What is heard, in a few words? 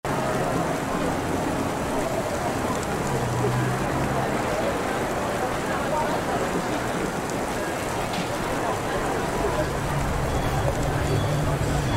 ambiance busy area; cloudpunk